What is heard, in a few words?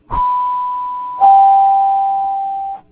Slow Dual Chime